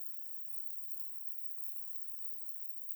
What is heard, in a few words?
single pickup, Gas Leak; Handheld Acoustic Imager